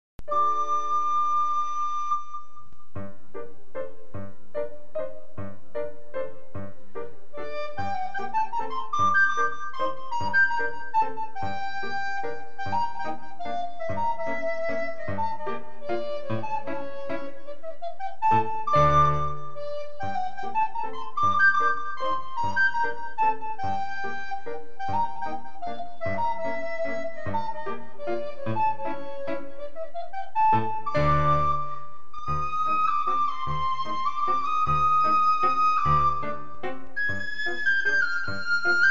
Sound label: piano reduction; peer gynt suite; grieg edvard